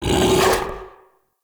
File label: Battle Dog Attack Bite; Animals; Vocals